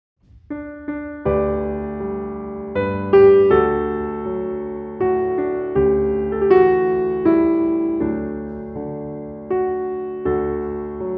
Accompaniment; Brahms Lullaby; ViolinSchool

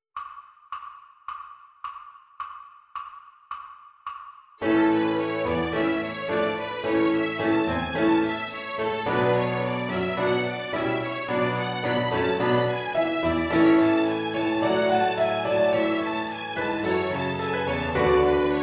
Backing Track; Study in A major; ViolinSchool

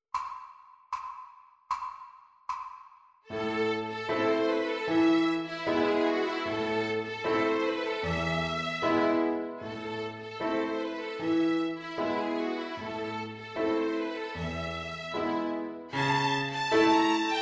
24th Caprice Theme Violin Piano Backing Track; ViolinSchool

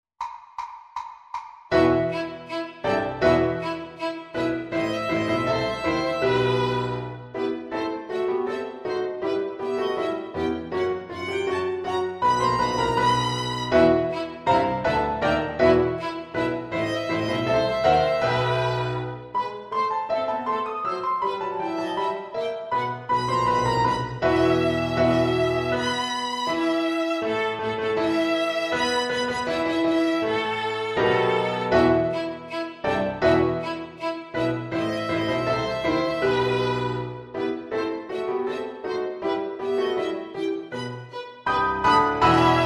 Violin Piano; ViolinSchool

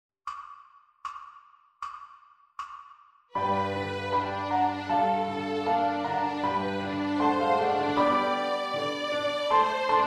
Backing Track; ViolinSchool

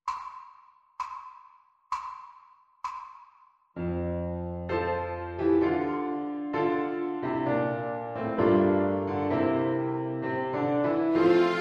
Backing Track; I Like the Flowers; ViolinSchool

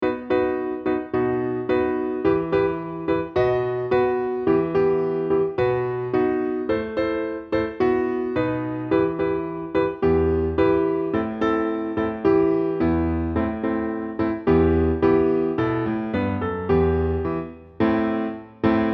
Accompaniment; ViolinSchool

